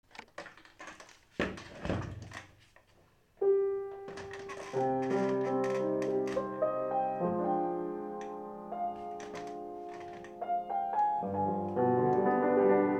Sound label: Piano Improvisation; "Improvisation - Flows" - recorded in International House practice room